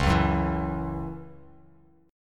C sharp Minor Double Flat 5th C sharp Bass Standard; C#mbb5; strummed; JGuitar